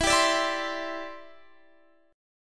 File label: E Minor 7th Sharp 5th, down, Guitar, Half Step Down